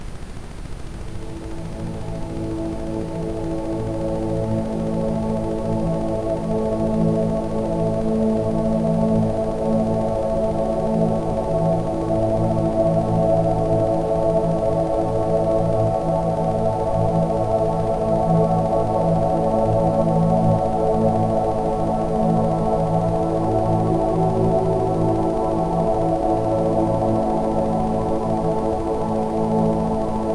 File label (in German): Rife Achtsames Momentum mit Ambient Sound; rife frequenzen